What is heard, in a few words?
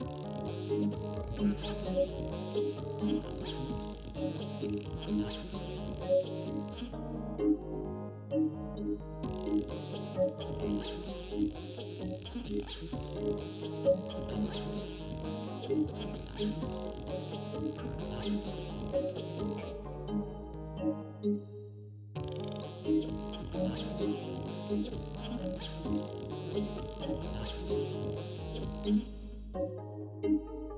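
music fight; yo frankie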